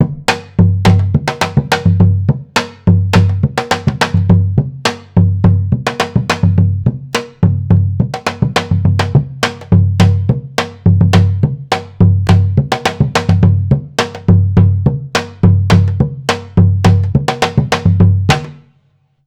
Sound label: zabumba medium rhythms; brazilian series; muletone audio